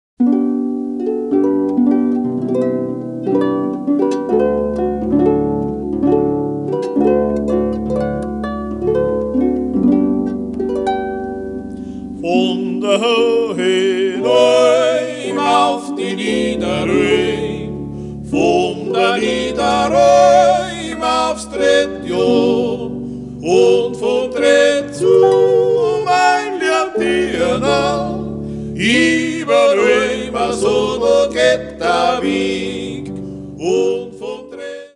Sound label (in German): Volksmusik